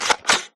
mg5 switch, weapons

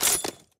mosin switch, weapons

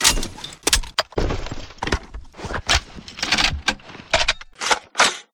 mg5 reload; weapons

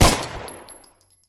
cz75a fire; weapons

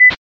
radio fire; weapons

